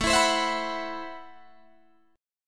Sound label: A Minor 6th Add 9th, Guitar, Drop D